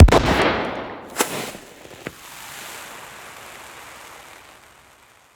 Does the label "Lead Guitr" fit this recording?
No